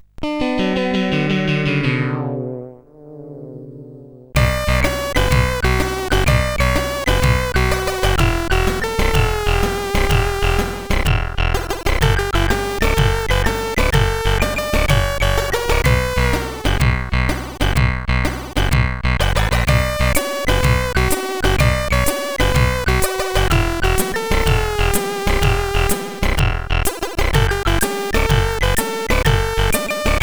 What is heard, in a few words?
Blues, sid CSG8580R5